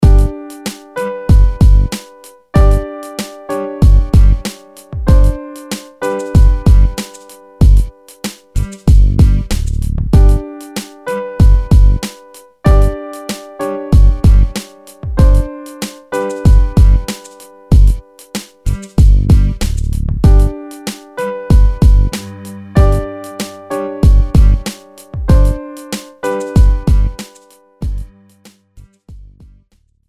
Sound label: Piano, Suspense & Drama